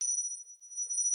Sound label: coin toss